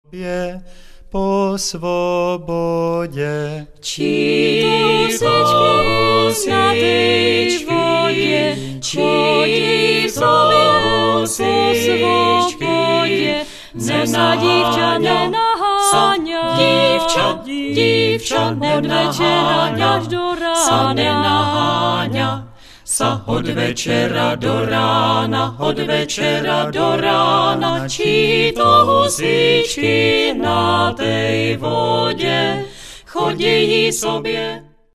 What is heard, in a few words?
acapel